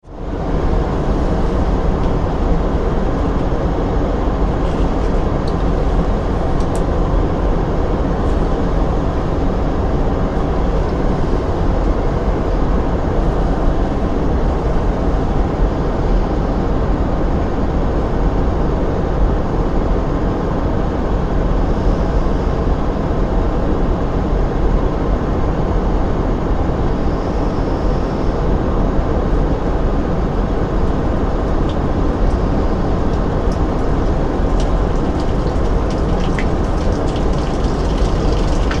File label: Aircraft toilet cubical atmosphere and taking a leak